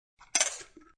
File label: Metal Lid Close, Thin metal lid closes.; Small Metal Lid Close; Drink Mixing Sound Effects; Bars